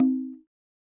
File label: ting